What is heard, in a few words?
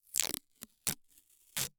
Tape Adhesive Tape Pulled Off Roll, Household